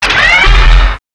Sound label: stomp